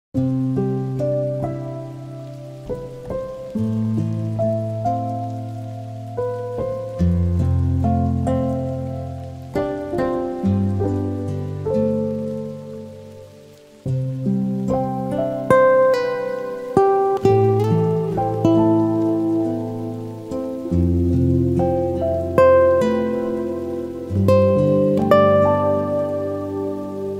Guitar and rain

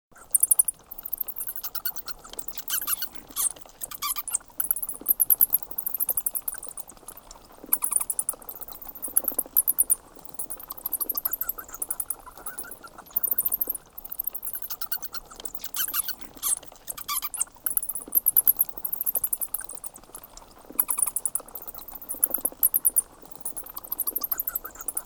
Bats Flock Squeaks with Chatters

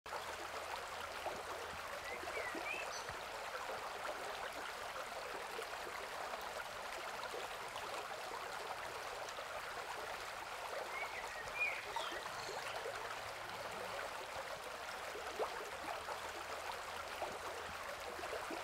Nature Sounds River

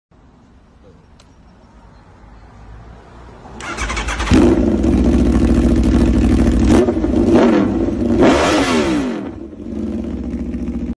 Racing exhaust

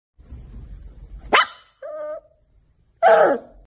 Papi dog; سگ پاپی

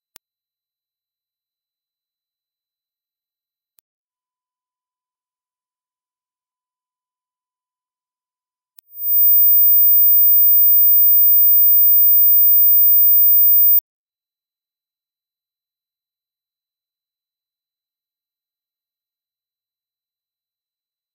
Ultrasound of rat excrement

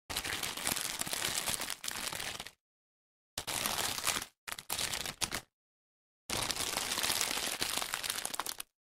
Pack of chips

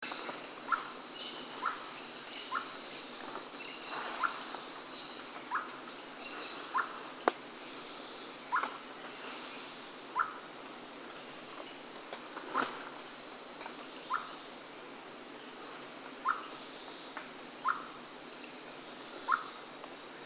Rusty belted tapaculo

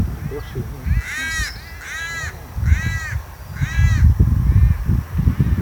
Cuban palm crow; کلاغ نخلی کوبایی

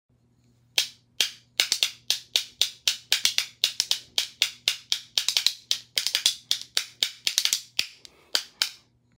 Castanets

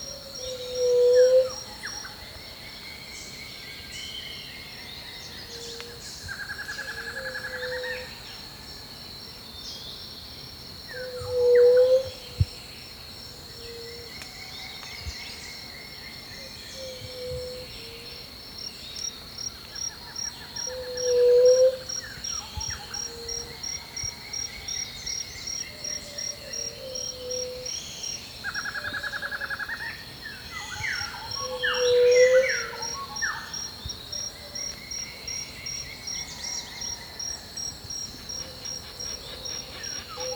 White tipped dove, قمری ورو

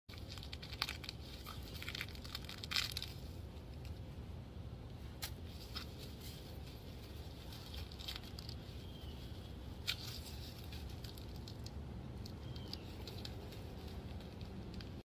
Weeding; کندن علف های هرز

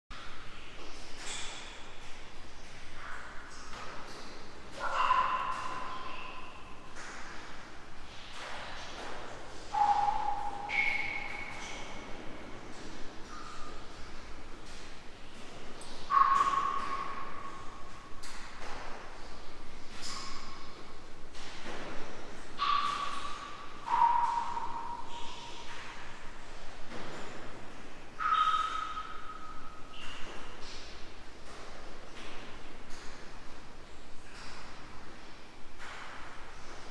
reverberation stone space; عمق ریورب خفیف

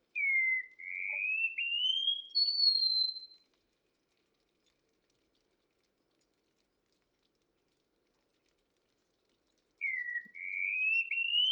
Wallcreeper

